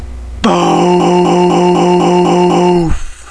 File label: big boof